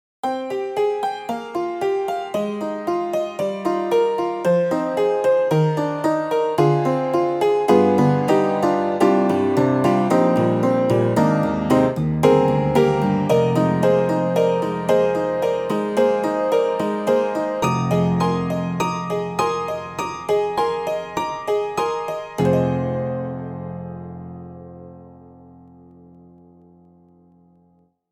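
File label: tacky ragtime, piano; IK Multimedia; Sonik Synth 2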